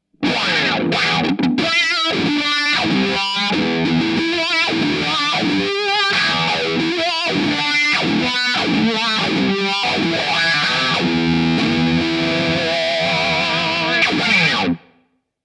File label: Voodoo Style Wah